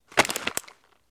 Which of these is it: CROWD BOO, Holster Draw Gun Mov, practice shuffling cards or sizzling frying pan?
Holster Draw Gun Mov